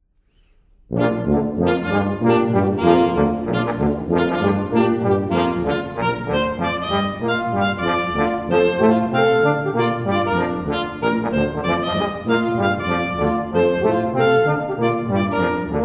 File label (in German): Blech Boogie; Muehlenhaus Boogie